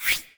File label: chat slide in; ui